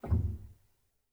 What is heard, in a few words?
rel, Grand Piano; bitKlavier Grand; Piano Bar